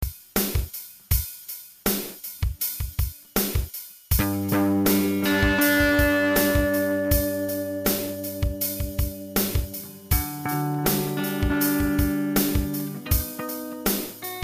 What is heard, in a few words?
delay; デジタルディレイの製作です。ディジタルながらも、アナログディレイの温かみを持った音です。